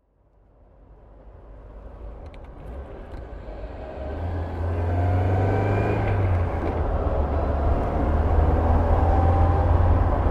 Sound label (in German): Jensen-Healey Innengeraeusch